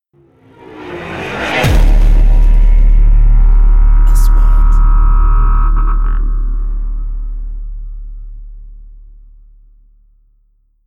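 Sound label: 3D SFX Trailer; مؤثرات تشويقية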